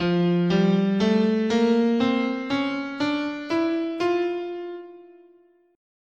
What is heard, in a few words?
F Bebop Major, scale